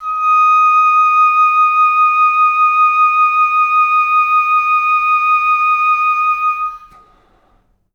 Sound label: oboe, D#5; Best Service ProSamples vol.51; Classic Orchestra 2